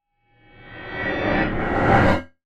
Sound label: Fast Science Fiction UFO Transition; Crafted Signals